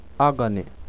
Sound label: agony